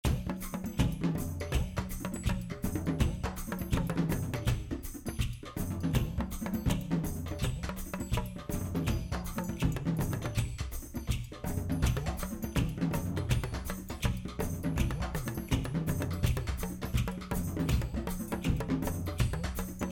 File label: drum school music; afterbeat